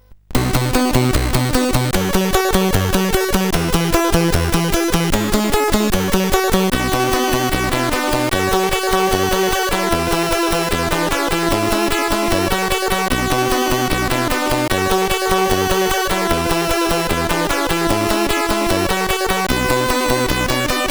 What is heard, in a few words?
Light, sid CSG8580R5